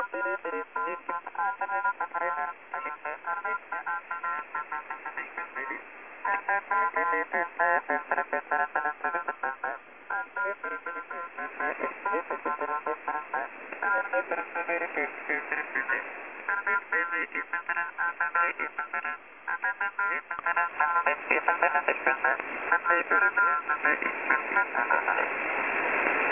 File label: Short Wave Radio Noise